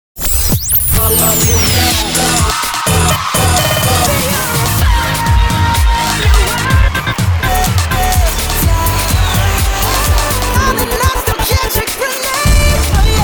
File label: beatmix; dancemixusa